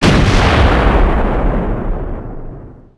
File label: artillery